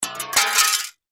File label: Crash Glass Pane Break Drop Small Crash Debris; Breaking; Blastwave